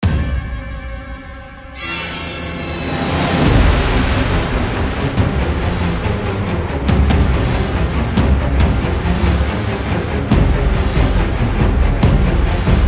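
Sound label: Haunted, Dark horror background music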